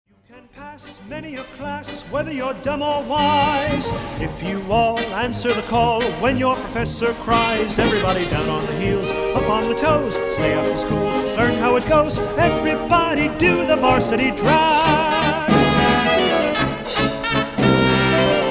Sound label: vocal